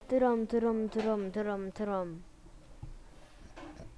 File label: drum